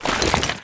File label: ore stone